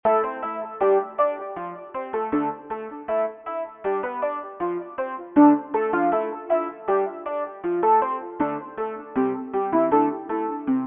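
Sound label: Digital Introspection Lo Fi, introspective emotional music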